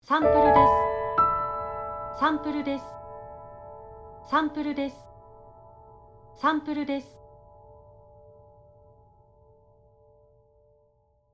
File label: Logo Piano low